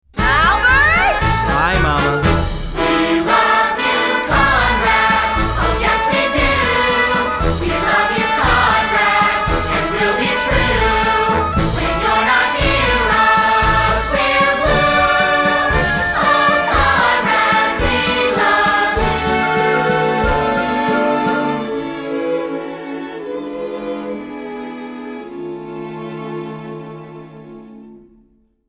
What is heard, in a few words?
Vocal Guide